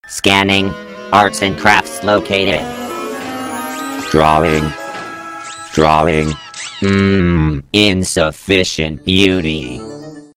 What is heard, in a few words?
scanning